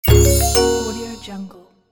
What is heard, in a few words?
small success